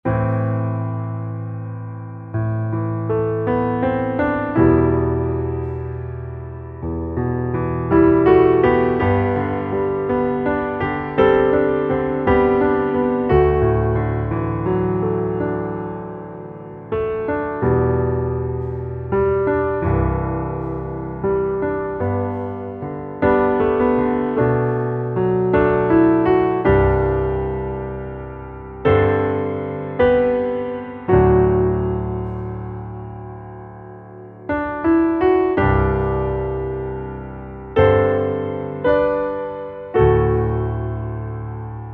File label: Underscore PIANO; Worship Flow